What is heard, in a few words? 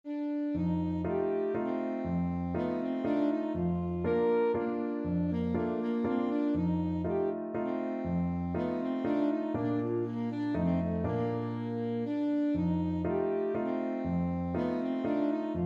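German Dance, alto saxophone; schubert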